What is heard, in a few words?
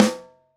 Snare Top